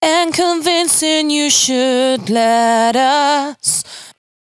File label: 92bpm Bb, Velvet Vocal; Zero-G